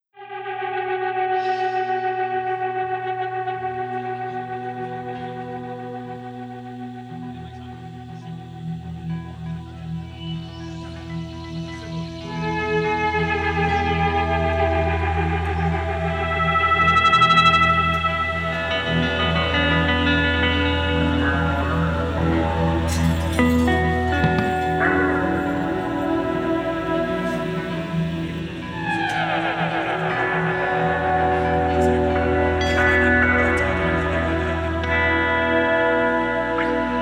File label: bells; Belles